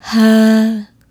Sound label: "Hah" A2, Swingin' Single Notes